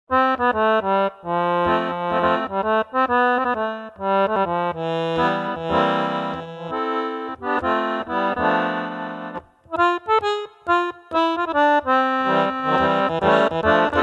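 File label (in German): TA3000eco Jazz, Akkordeon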